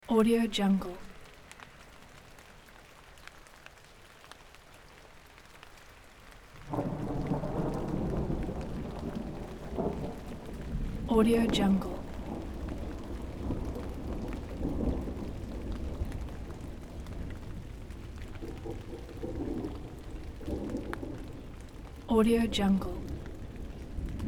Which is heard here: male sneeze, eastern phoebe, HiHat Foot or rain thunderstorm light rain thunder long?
rain thunderstorm light rain thunder long